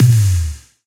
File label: impact Oct Up; hotline2